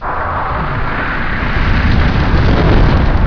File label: FLAMER